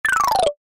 場面切り替え 木琴 下り 遅め

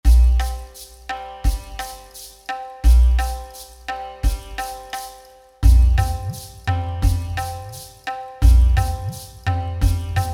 村のようなBGM

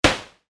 アサルトライフル 1発; 武器効果音

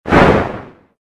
モンスターの羽ばたき 1回 モノラル

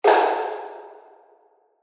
風呂桶の音

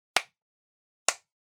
二拍手

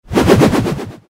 斧が飛んでいく モノラル

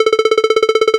会話; nes retro talk sound effects

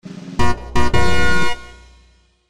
ファンファーレ; 動畫和制作視頻的音效

